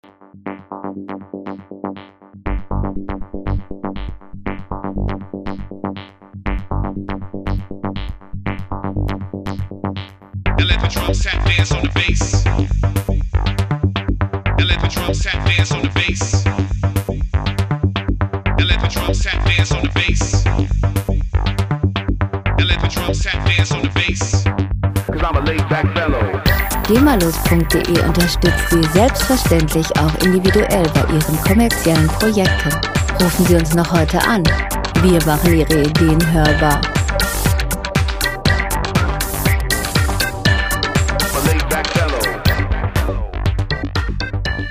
bass

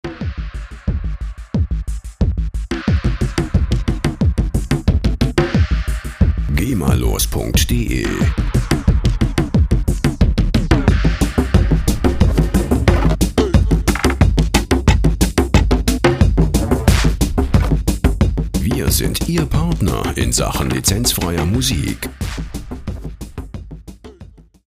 conga kongo

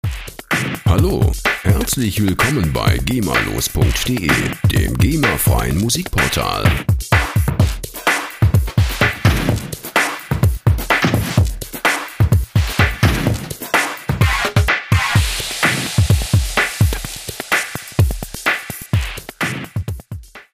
drumloop

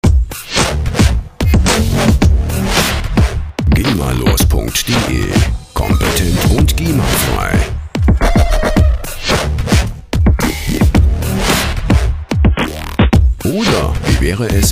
dubby groove

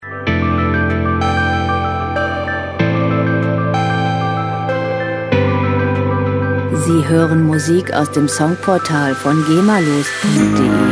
synth boulevard

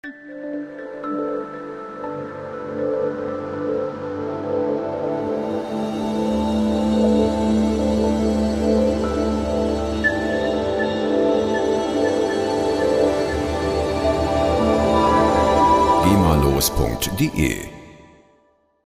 riser

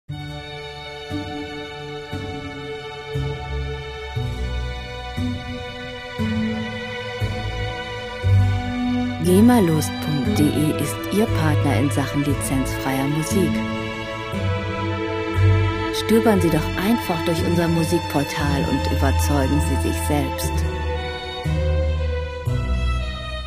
air on the g string

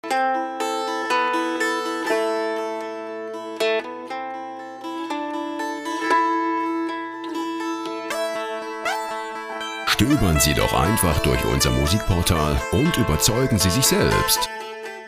dulcimer

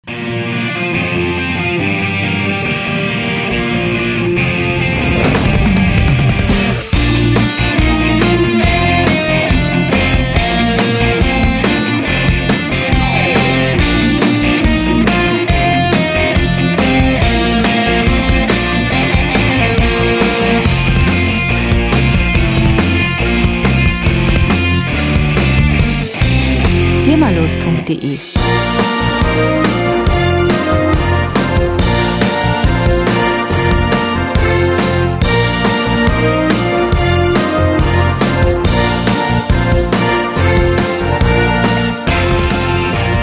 rock

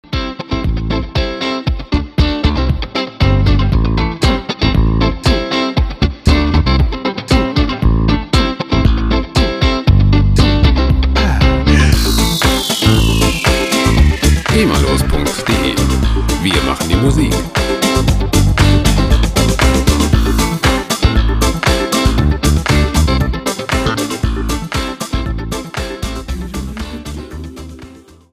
jamming

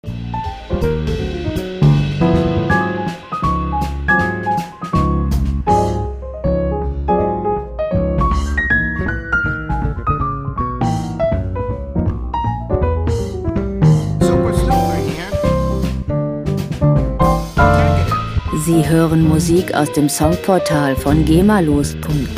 quartet

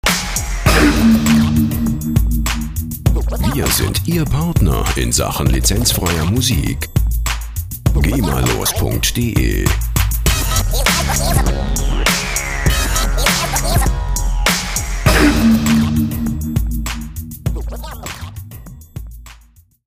dj turntable

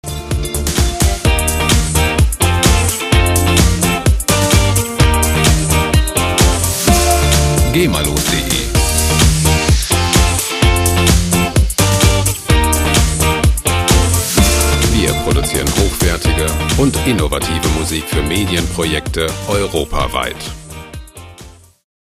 guitar

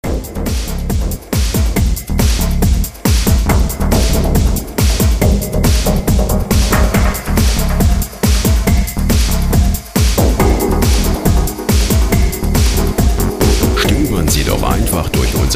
rhythm

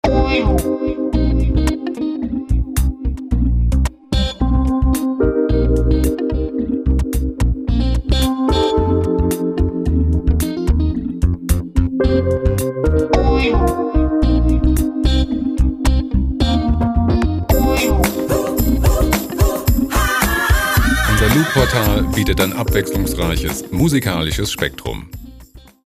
retro soul

